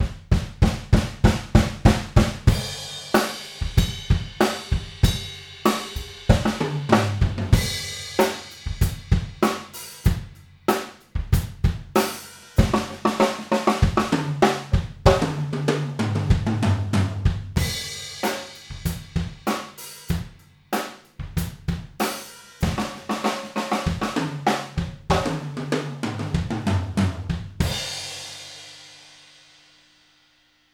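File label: Room Mono Cardioid, drum; drum shootout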